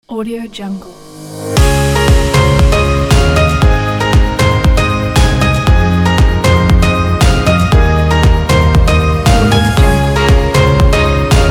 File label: corporate ident